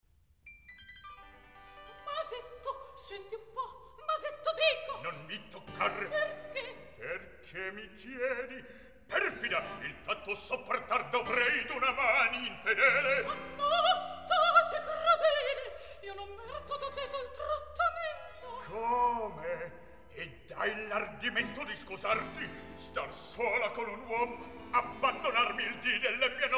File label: Recitativo; Masetto senti un po'; Mozart, Wolfang Amadeus; Don Giovanni